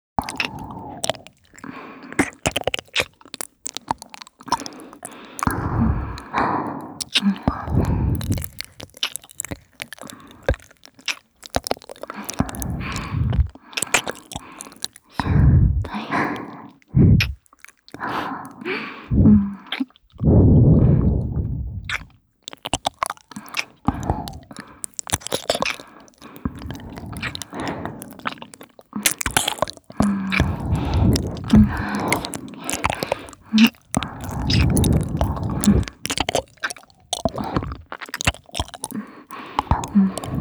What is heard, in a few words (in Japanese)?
キス＆ラブラブ耳舐め; 渚